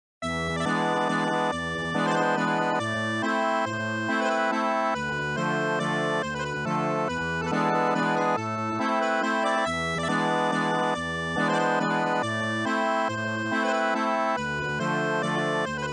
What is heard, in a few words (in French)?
Accordeon; սայաթ-նովա-դուն-էն-հուրին-իս